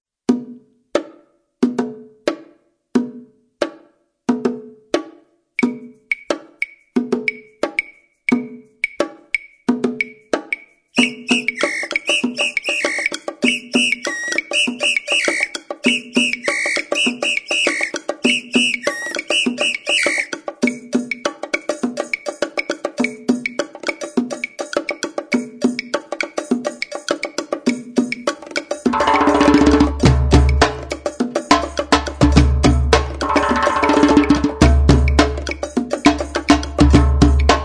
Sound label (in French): Samba